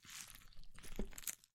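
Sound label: lettuce leaves sounds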